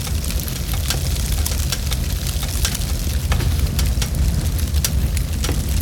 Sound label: ambience fire detail